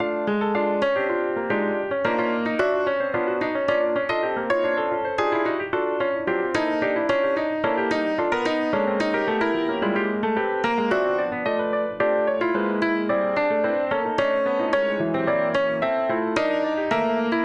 piano Gospel 110bpm

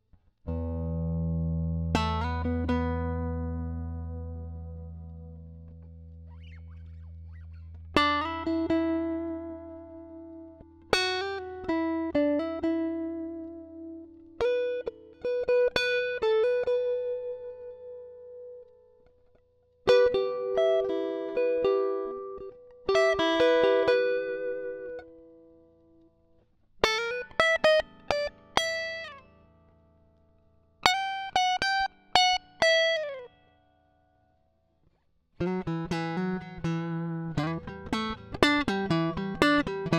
Em120 GTR IMPRO dry; Flavourtones

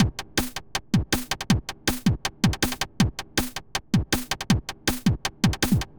Liquid Space

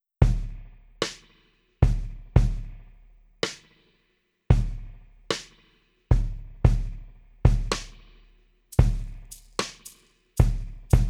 Dont Fret Rhythm

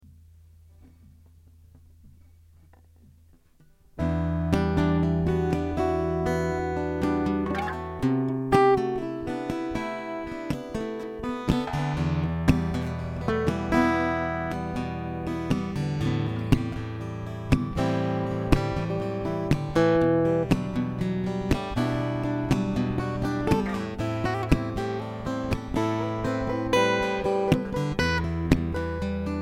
Em 120 Acoustic Jam dry

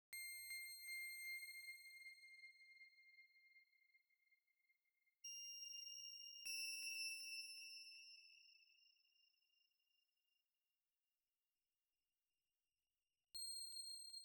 Twinkling 80 bpm; Interstellar Ambience; Zenboy1955

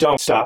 vocal seed

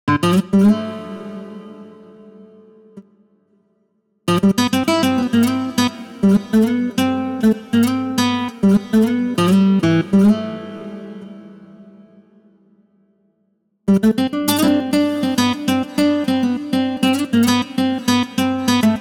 nylon guitar

